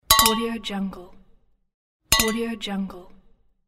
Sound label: glasses; archisound